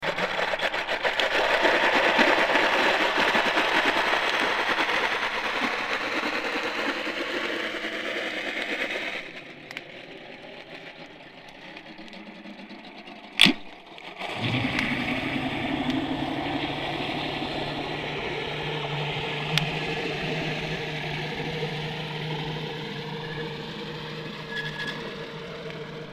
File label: outboard